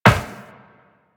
Run Metal Perforated, Footsteps; DE; Warframe; Tenno

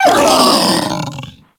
Feral Vocal Death; Enemies; Kubrow; Warframe

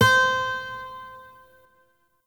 Shawzin Oct Three C; Warframe; Lotus Shawzin

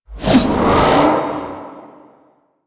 Spear Gun Throw Charge; Weapons; Warframe; Tenno; Hoplite

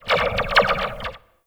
Zipline Random Slow; Gadgets; Parkour Zipline

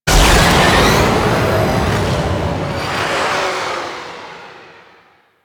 Fighter Thruster Lift Off Long, Ship; Ambience; Props; Warframe; Corpus Ship Remaster

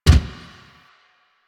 Gear Shift Up, Motorcycle; Motorcycle Ninety Nine

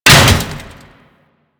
Mace Impact Metal

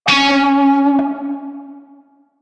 Shawzin Flourish Oct Three C Sharp; Music; Warframe; Narmer Shawzin

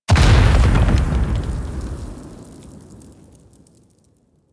Handler Scorch Grenade Burst; Warframe; Catbrow Handler

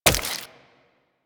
Wall Jump Flesh; Footsteps; Warframe; Tenno; Wall Run

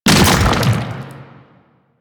Octopede Horrors Arm Spawn; Warframe; Man In The Wall

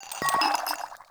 Vial Foley

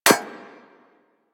Bullet Impact Generic Metal; Surface

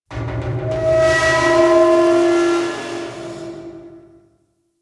Settlement Metal Screech; Ambience; Warframe; Grineer Settlement

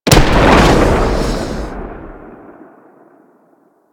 Torpedo Explosion Underwater, weapons; Grn Torpedo Pistol

